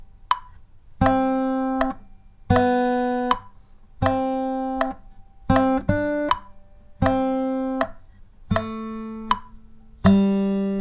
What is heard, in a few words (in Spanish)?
melodia a contratiempo en compás 4x4